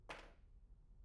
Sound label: pendrop; IEEE AASP